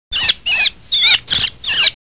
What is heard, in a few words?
starling